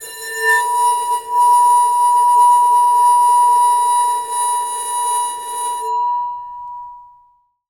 Bowed, Large Wine Glass

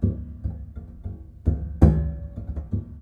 Knock Erratic, Piano; Piano Miscellaneous

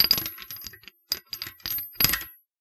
Clatter, Trombone Extended Technique

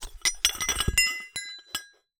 Hole Saw Rattle, Hole Saw Bits

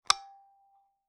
Taxi Meter

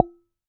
Base Hit, Large Wine Glass

